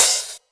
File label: Open HH, Cymbals; Vengeance; Essential Clubsounds Vol.4; Open Hihats